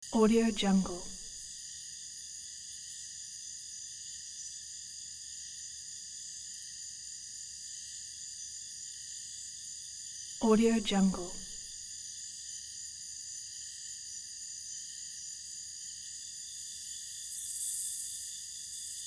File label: ambience night wildlife loop pack